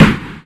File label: gunshot; Weapon-Explosion